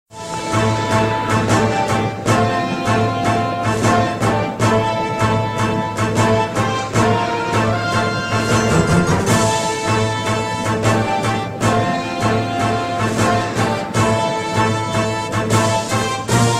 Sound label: Castle Theme; SM3DL